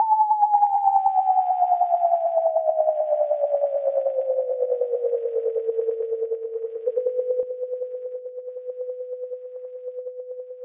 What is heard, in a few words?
Alien